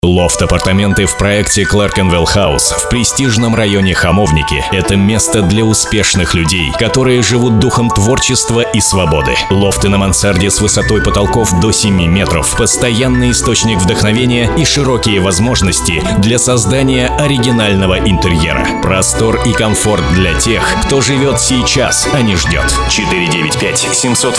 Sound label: piano